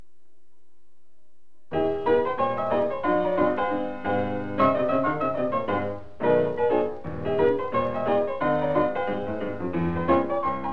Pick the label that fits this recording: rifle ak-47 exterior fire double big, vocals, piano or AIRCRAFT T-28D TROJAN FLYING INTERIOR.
piano